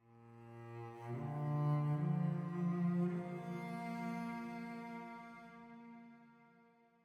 Celli 58bpm 4-4

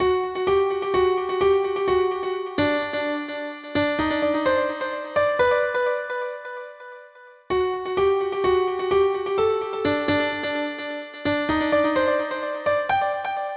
Melody

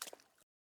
water step; teardown; ps5 windows xbox series xs

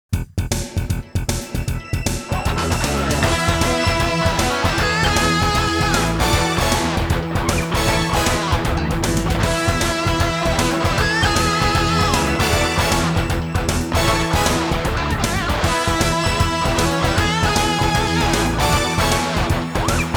All Clear; Super Darius II; PC Engine

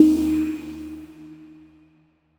Cmin - collision; Color Zen Kids; 3DS, Android, iOS, mobile, Switch, Wii U

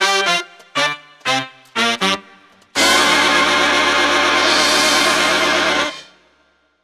catch; Meteor World Actor

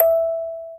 Vibraphone E; Kaminazo Mirai kara no Omoi de

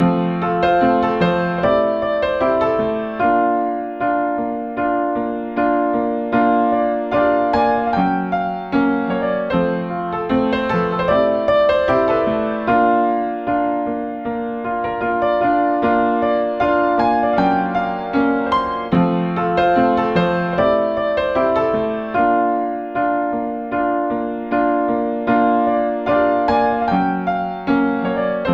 Lonely Tyroler Piano Player; Grand Mountain Adventure

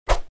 Assassin Attack

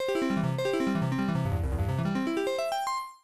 Lute Tuning; The Black Cauldron; Apple IIGS

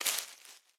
foliage hit

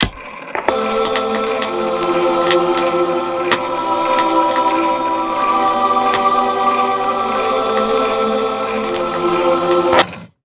Gramophone; Buried

